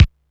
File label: BD SOFT, Techno 138 BPM; Best Service ProSamples vol.54; AKAI